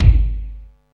dope bass drum single shot g key, Royality free bass drum sample tuned to the G note